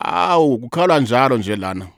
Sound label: Vocal Chirp, African Voices